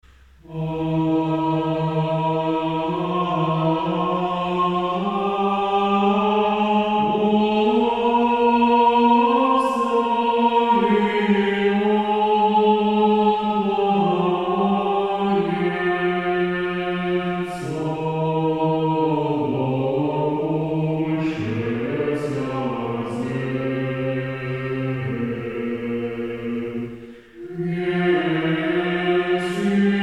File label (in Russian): Успение светилен